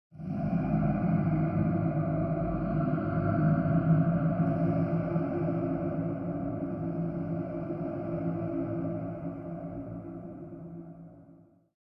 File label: Ghostly ambience and otherworldly voices